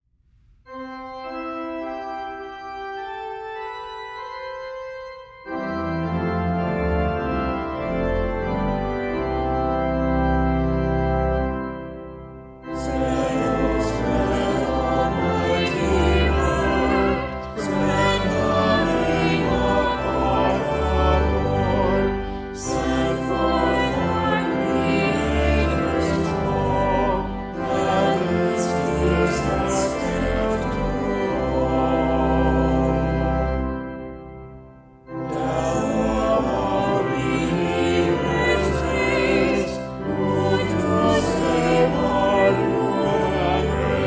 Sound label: Hymn; Spread Oh Spread Almighty Word